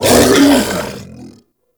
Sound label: Infested Femur Vocal Attack; Warframe